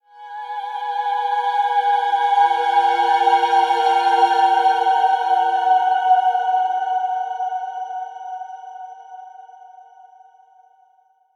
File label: Mystic Choir Sweep; Trials of the Blood Dragon